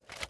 Zombie Shield Move

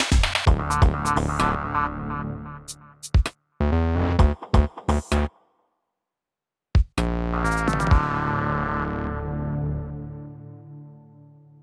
Forest Battle End

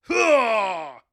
Airslam

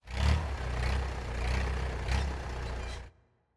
Fan Start; Legend of Keepers (gamerip) (2019)

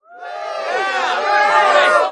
Music; Music Frontend

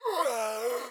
Rat caller Prepare

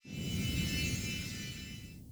Lantern

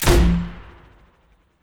Mortar

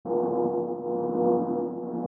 Walk; starbites

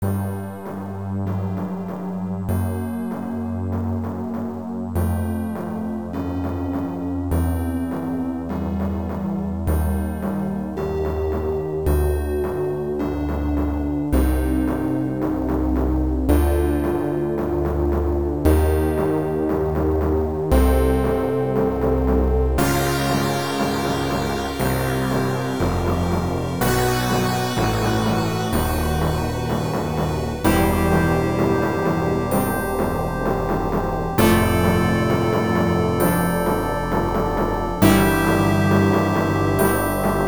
Ending; Drakkhen; X68000

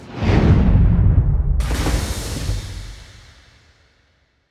Windy Landing Gentle; Zephyr

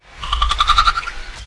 Crab Idle; unsighted; enemies

